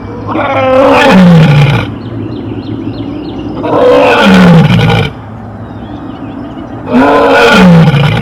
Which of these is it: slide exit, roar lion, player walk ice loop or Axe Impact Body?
roar lion